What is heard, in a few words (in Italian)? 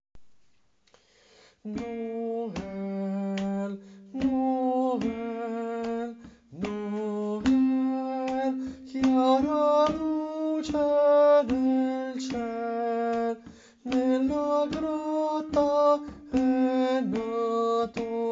tenori, Coro; Coro Isola del Giglio